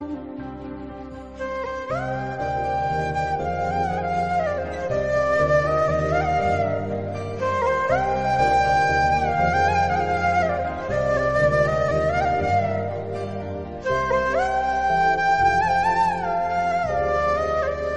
Sad Love Flute BGM, Telugu

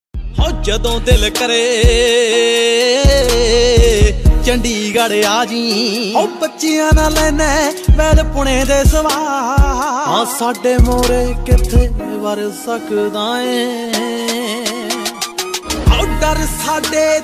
Dance Punjabi Ringtone

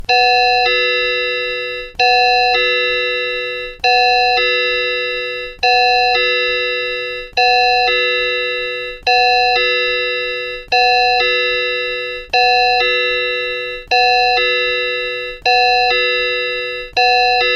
Alarm Wall Clock

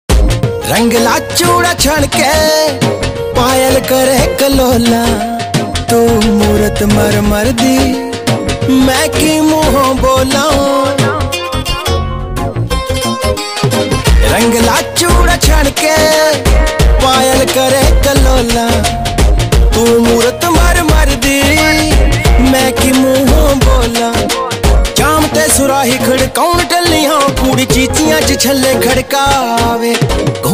Punjabi Ringtone

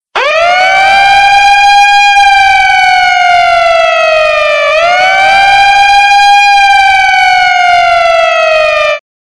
Firefighter Siren Ringtone

